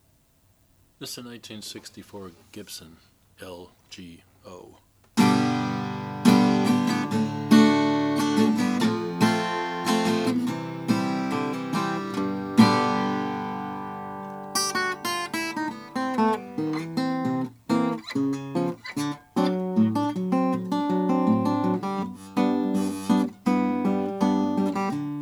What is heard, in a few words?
gibson lg0 mahogany natural